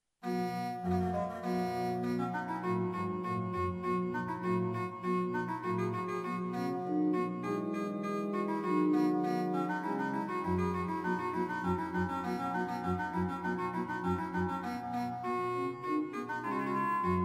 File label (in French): orgue; Reducciones